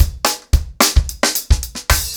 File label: 110BP, ACOUSTIC DRUMS; Sonokinetic - The Roots Drumloops